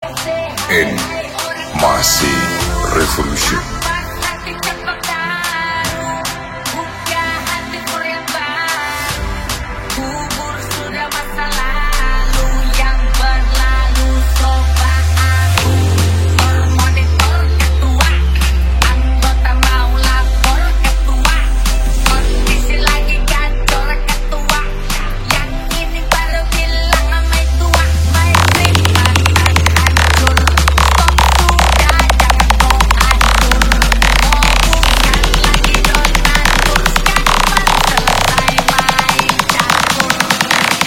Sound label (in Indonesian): dj tor monitor brewog audio cek sound horeg karnaval